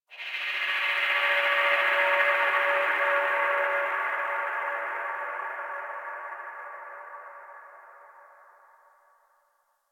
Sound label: Space One Off C, Ambience; Warframe; Tenno